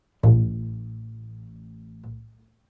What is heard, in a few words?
Double Bass A 1 Pizzicato Non Vibrato